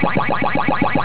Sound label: power